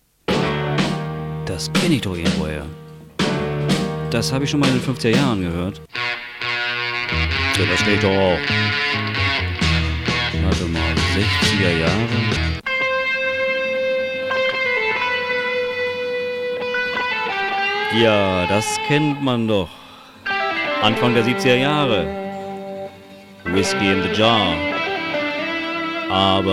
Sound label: Jingle Music Box